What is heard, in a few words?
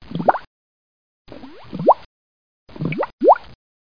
BUBBLE; Weird - The Truth Is Stranger Than Fiction